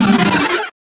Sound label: healing effect